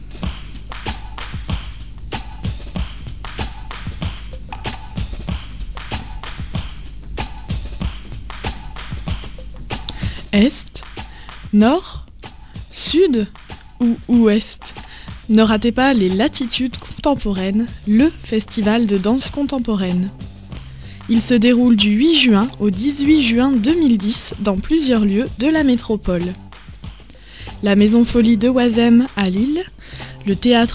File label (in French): JINGLE; LATTITUDES CONTEMPORAINES